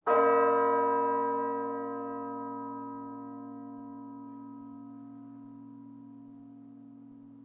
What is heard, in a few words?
prtch bell